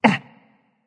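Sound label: non verbal ouch, voice; cernunnos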